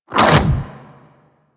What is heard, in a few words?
Infested Splitter melee swipe small; Warframe; Grey Strain